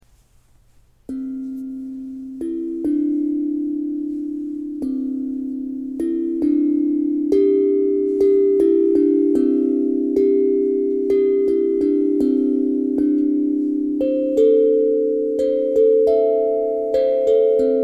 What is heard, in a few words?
hapi mini c